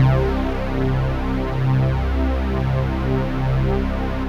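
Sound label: C-1 PULSE WIDTH, PWM; AKAI S-Series CD-ROM Sound Library VOL-7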